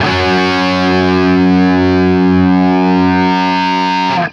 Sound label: 5TH F#, Guitar; East West; Steve Stevens Guitar Samples Collection